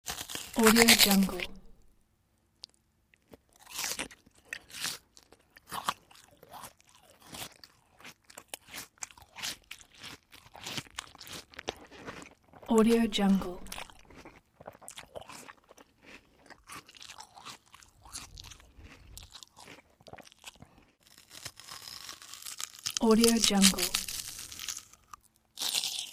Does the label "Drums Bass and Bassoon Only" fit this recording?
No